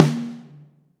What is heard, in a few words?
Drum Set